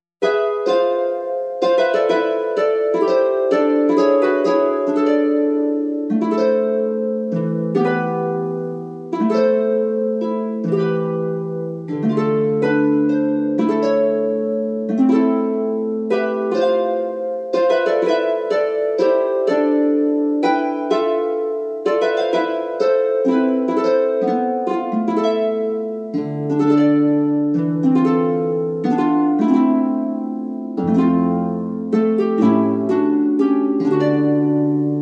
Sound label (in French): DULCIMER LA BELLE